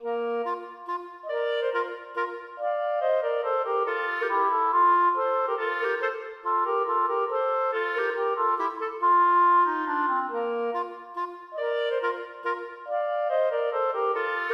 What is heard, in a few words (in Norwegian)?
Menuet for klarinett duett; Boken er fra ca 1750; Aamodt 001i; En samling duetter fra Aamodts notebok